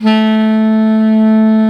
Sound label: SAX A1, SAXOPHONE; USB Soundscan vol.63; Balkanish Traditions; AKAI